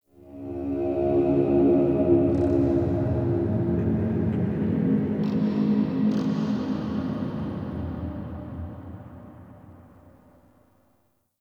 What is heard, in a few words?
Golden Maw Ambient Texture; Warframe; The War Within